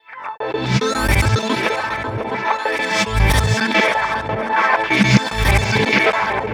Disco TransitionFX

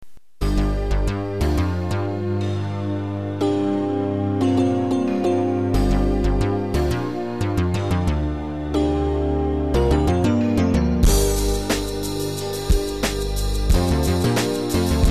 Elevator; barkley shut up and jam gaiden chapter 1 of the hoopz barkley saga

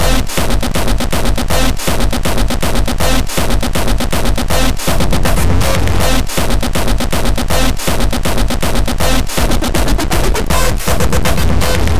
Beats Loop; Anger Foot

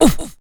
Oof

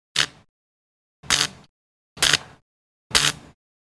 Electric Spark

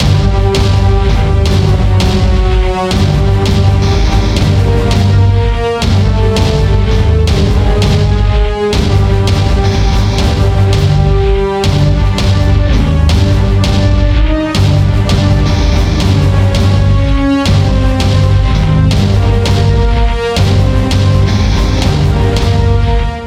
Tension - Short Loop; Spacebourne

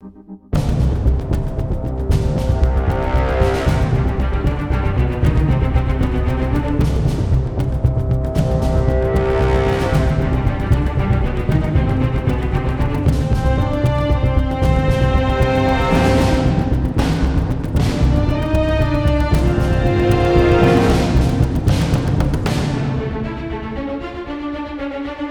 String; Assassin's Creed Unity

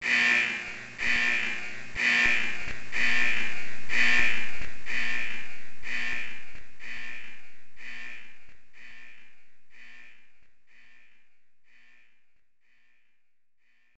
Warning; Lightning Soldier; x68000

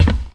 Footsteps; unreal development kit

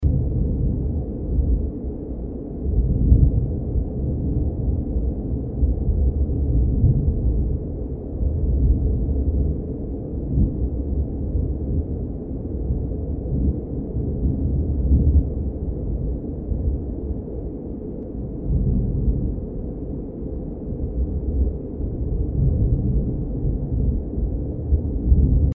Night Ambience; escape the backrooms; Level 94

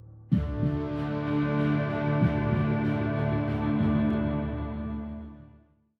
190bpm

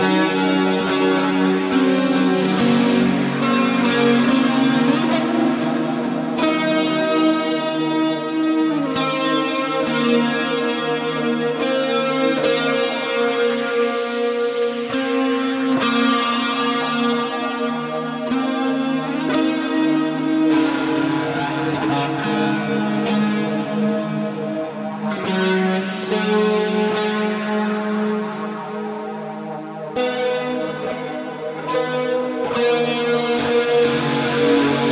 Guitare Hi; GAUGE

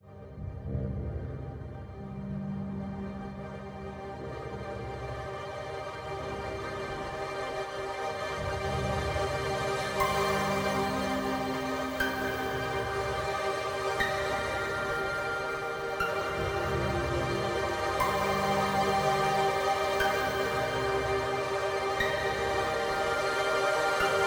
Menu Theme Ambient; Sublevel Zero Redux; PS4

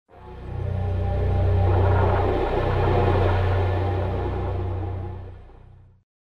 Sewer Transition

